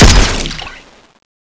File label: voidpistol; cstrike; weapons